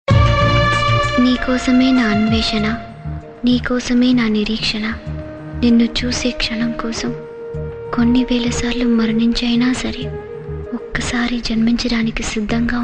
Female; Arya

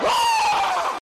scream; Brock Lesnar

